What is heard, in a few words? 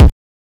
mod kick, Mod Drums; neuro; Sinistarr